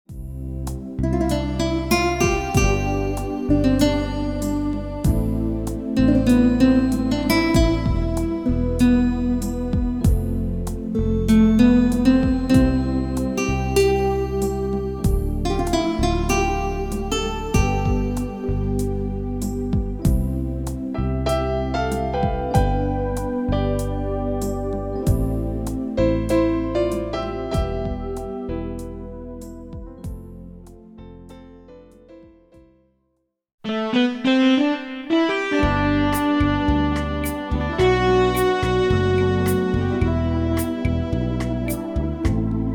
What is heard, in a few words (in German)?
Popsoundsbeispiele; VSP II Pop- & Modernsoundbeispiele; VIVA MUSICA Fachhandel